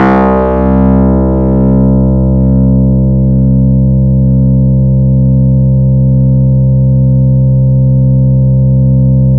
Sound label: MonophBass c3, Yamaha YX81Z